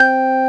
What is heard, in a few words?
organ, M1 organ; Best Service ProSamples vol.10; House; AKAI